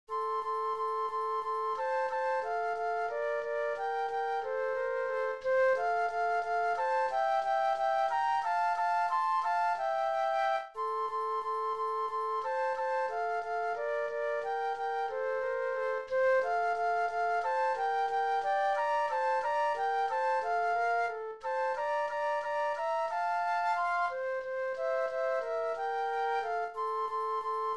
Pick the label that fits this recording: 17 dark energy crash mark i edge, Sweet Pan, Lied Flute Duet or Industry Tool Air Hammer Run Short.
Lied Flute Duet